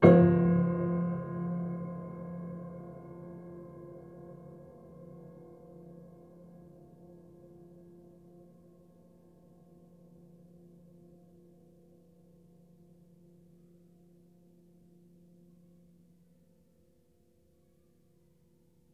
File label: Harm Ped Low, Piano Harmonics